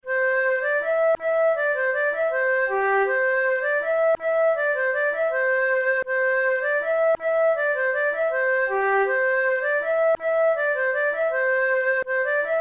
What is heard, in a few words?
flute